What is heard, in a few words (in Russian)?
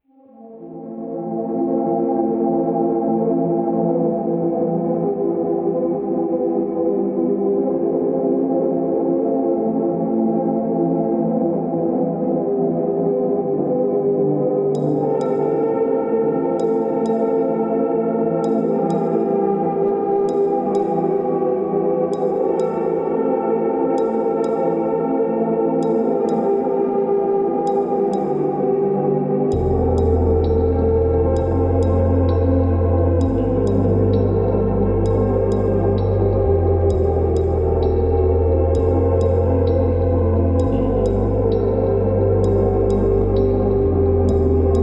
Elektronnyj zvuk